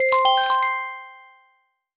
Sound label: tournament started